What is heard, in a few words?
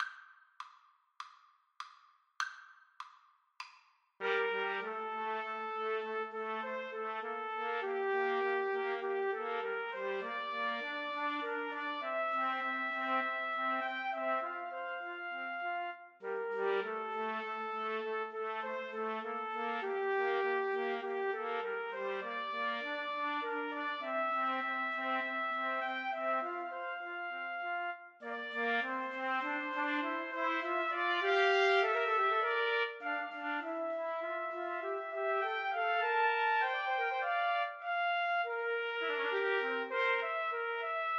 idylle, Trumpet trio